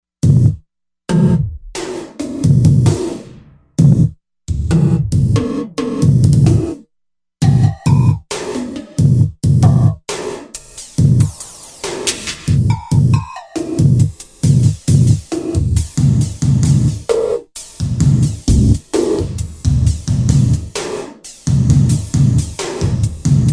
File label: Velo Gated, Korg MSC-07S Synth